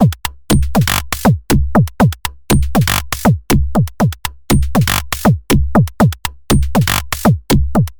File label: Aerobic Loop